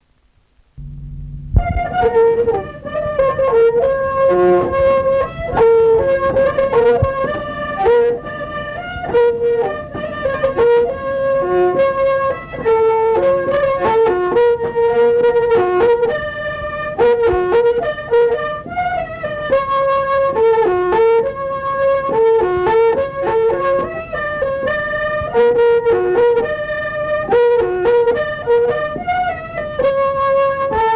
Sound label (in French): valse